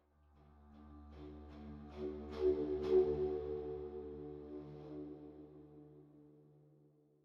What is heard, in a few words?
Flute